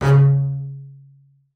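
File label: marcato C#3 left, bass spiccato; Xsample VOL-5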